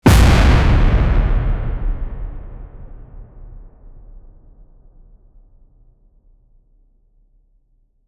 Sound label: a impact that is a, boom